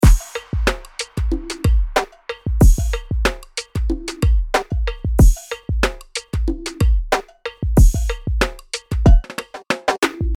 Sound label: beat